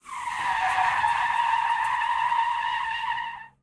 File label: skid asphalt; Blind Drive